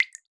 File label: Drip; Drips